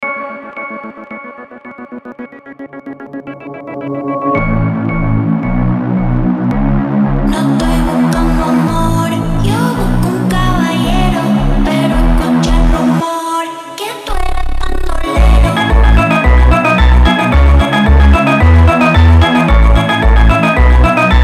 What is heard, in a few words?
Phonk